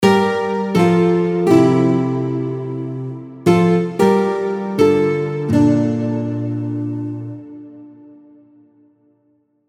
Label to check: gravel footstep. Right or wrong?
Wrong